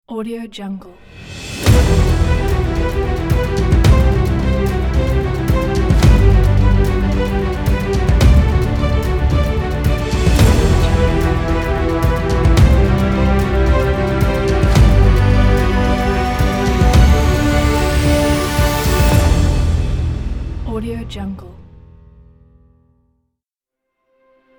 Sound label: cinematic logo; cinematic logo pack